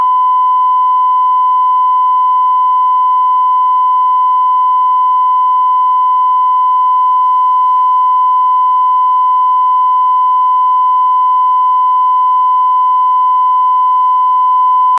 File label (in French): emetteur 1008Hz; effet-doppler; exoplanetes et smartphones